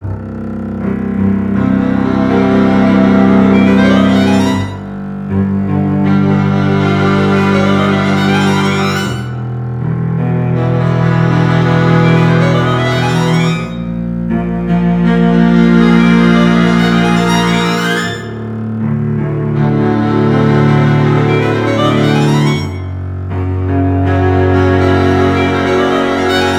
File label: Dodeca fanfare playback; LAP Music